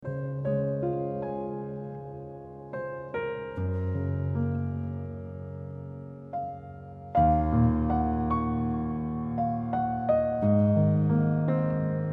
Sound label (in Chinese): 抒情的背景音乐